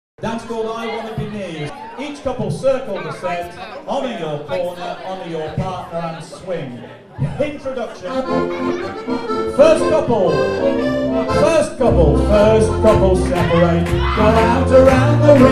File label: Dance, Singing Square Dance, with Caller